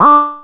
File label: Anasound Short to D, Industrial Analog Single Notes; Sonic Foundry (Sony Creative Software)